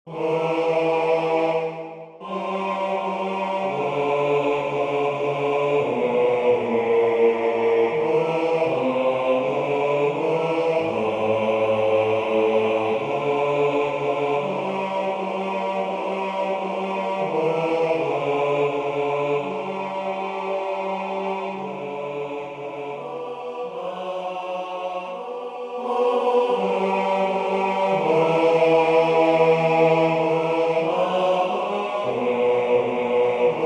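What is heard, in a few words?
bass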